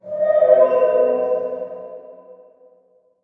dwemer screech; Skyrim Special Edition

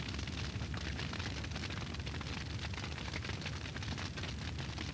fire; madcars2; radicalplay

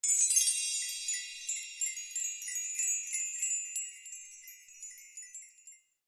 sparkle; Kung Pow

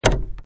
mover click

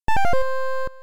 note fx; seagaia; ld22

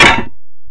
Hit Gasmask; bodilies

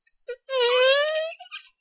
fart

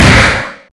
attack

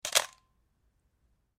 shoot

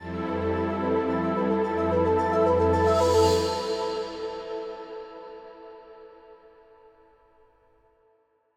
cargoship bgm intro; crosscode

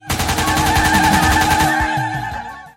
winner

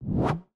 throw up; cross-code

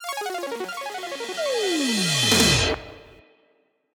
old hideout battle, bgm; crosscode

